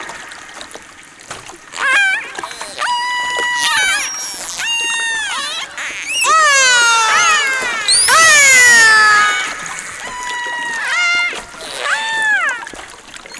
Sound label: Orca Transient Call; Jim Nahmens